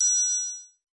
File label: triangle; Triangle Kit; ETHNIC and WORLD PERCUSSION